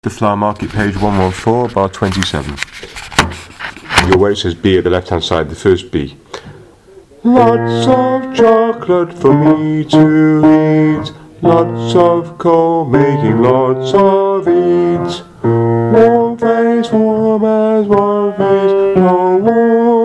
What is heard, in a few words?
tenor 1 and 2; My Fair Lady